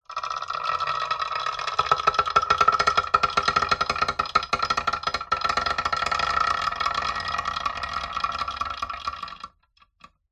industrial lever metal large heavy slow movement creak; zapsplat